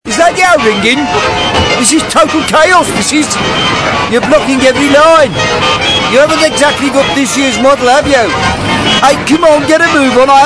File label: Traffic Jam